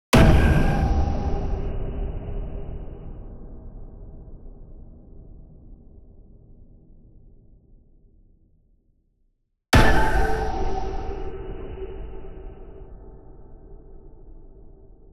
dark impacts; The Sound Pack Tree; The Whooshes & Impacts Pack

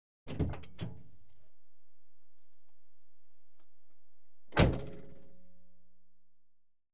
Car, Interior, Door Opens And Shu; Auto; BBC Sound Effects Library

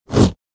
Double Bladed Saber Sound; FXHome

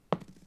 dice Throw, Casino; Kenney's Sound Pack

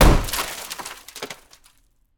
Glass Impact, Jaws Of Life; Secret Source

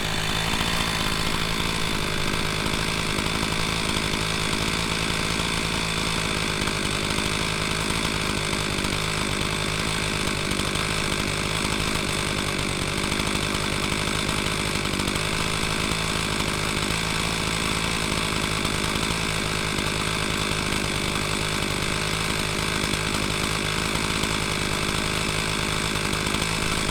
chainsaw gas big engine idle; mono; SoundHolder

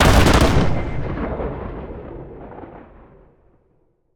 broadside, Naval Warfare; Gladestock Studios

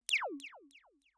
laser; Kenney's Sound Pack; Digital Audio

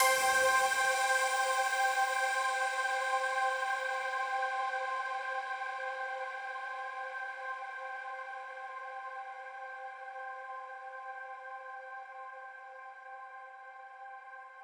Ahh C; 99Sounds; Simply Space

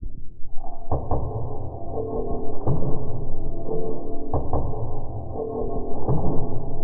Loop - 140 bpm; 99Sounds; Mangling Audio; Mangled Software; Convolution Abuse

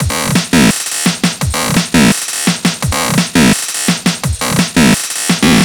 Loop, 170 bpm, Stutter Sounds; 99Sounds; Mangling Audio

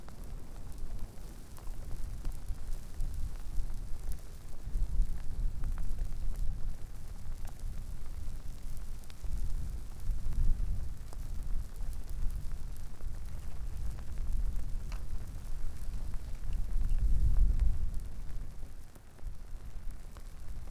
Lava Short Windy, Kilauea Volcano in Hawaii

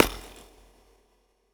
hit, Processed Foley; Sound Design Tools

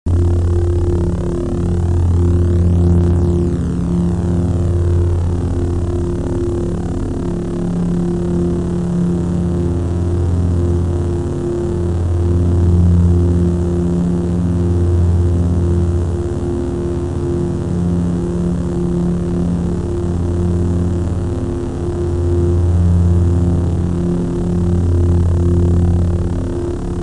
Saber Drone; FXHome

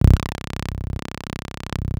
Bass drone G, Circuitbend; Drones - Bass; 99Sounds; Mangling Audio

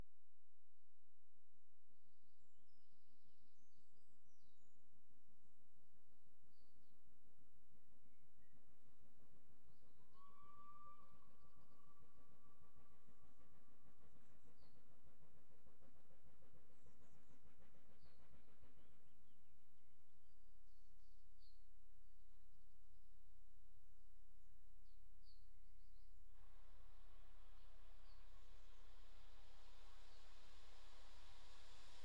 Train Approaches And Stopped By S; BBC Sound Effects Library; Age Of Steam

